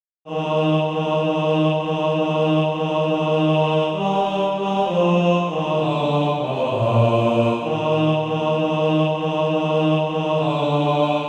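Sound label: bass, SATB Practise Music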